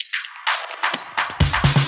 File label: Fill Ins 128BPM; Vengeance Samples